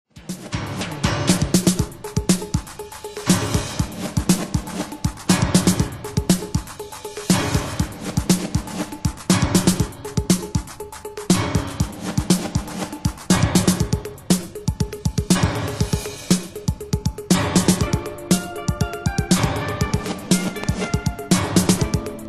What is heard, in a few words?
confused beats